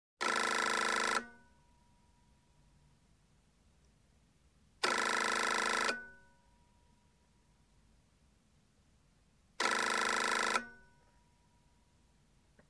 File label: Telephone, European Ringing, Foreign, Office; Sound Ideas; Series 2000